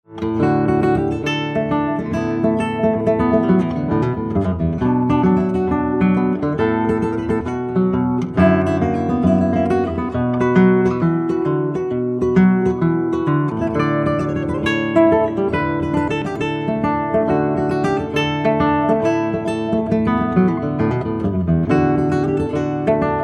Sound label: Jig